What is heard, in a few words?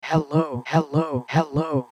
lfo hp; nyquist